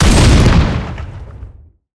fire, weapons; barrett

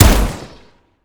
fire, weapons 870; Urban-Coalition; Decay; arccw ud

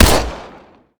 fire, uzi; arccw ud

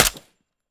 mech, m16 weapons; Urban-Coalition; Decay; arccw ud

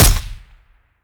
fire supp; weapons; m16; Urban-Coalition; Decay; arccw ud

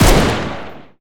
fire; arccw ud

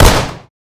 fire, glock; arccw ud; Decay

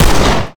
fire, glock; Urban-Coalition; Decay; arccw ud

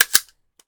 ch forward empty, weapons, m16; Urban-Coalition; Decay; arccw ud

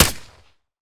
fire sup, glock; Urban-Coalition; Decay; arccw ud

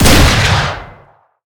fire; arccw ud

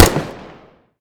first, weapons m16; arccw ud

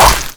melee arm break, violence; Benny Placeholders

